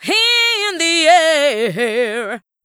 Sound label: Vocal In The Air; pitchglitch